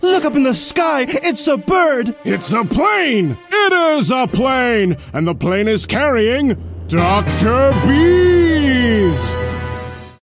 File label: Bees Plane